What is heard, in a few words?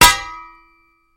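Sword Clanks Single; swords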